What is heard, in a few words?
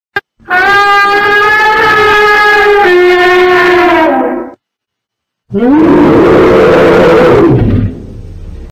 Mammoth